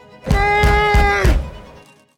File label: Bird; Backyard Bird Tracking With AI-Powered BirdNET-Go